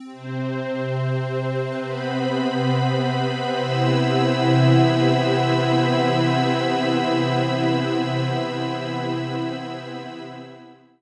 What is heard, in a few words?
crescendo; OneShot Fading Memory